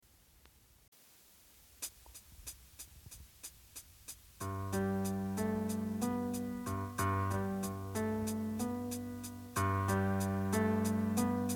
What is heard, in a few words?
piano vocal